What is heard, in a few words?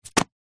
magazine cheap set down carpet; household